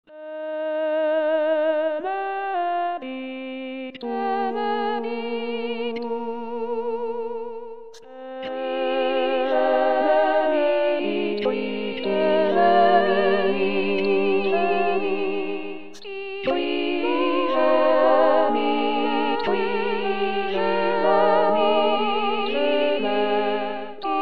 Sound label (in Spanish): misa canon para voces mixtas